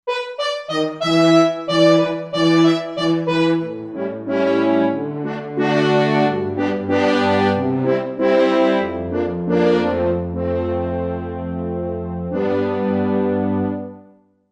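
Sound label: French Horns Section, Roland Fantom XR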